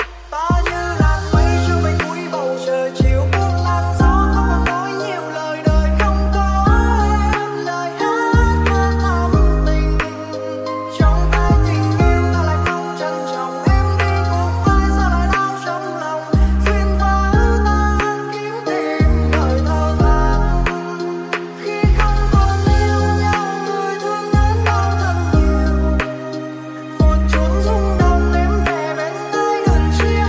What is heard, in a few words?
Lofi Ver.